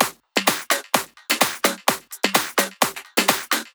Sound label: Loop, 128 BPM